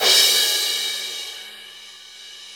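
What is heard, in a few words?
cymbal crash; Best Service Gigapack 2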